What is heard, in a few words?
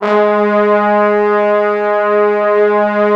G#2, Orchestral Brass; Best Service ProSamples vol.20 - Orchestral Brass; AKAI